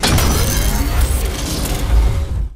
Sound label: Battery Powerup; Titanfall 2